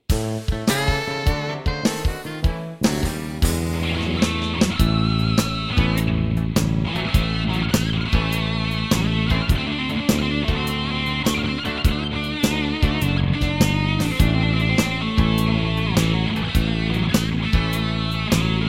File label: VOX Satchurator rock pop